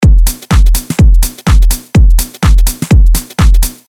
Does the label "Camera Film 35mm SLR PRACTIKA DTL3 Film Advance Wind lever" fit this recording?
No